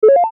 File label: button synth ascend; Multimedia; Blastwave